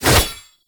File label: Bayonet Melee Whoosh Fast; Warframe; Tenno